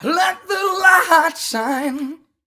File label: m 128 mel b-flat, Vocals